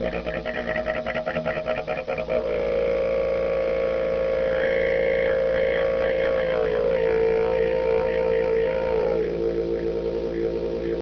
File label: didge